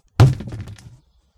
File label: Armchair Hit